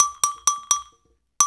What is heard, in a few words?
TAP, African; African Contemporary; Spectrasonics; Supreme Beats